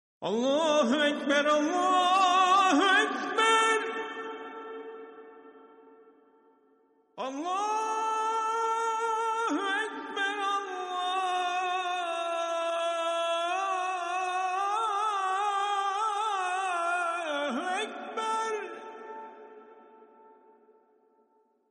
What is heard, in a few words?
azaan turkish